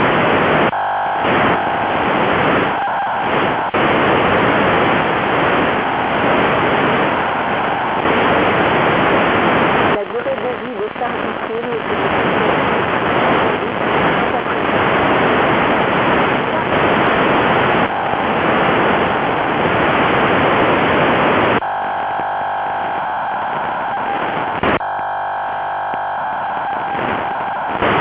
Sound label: idefix rx uhf